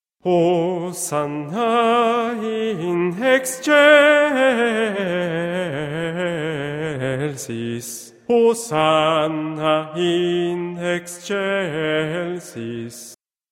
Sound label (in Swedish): bas; Benedictus